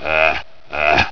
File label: aah aah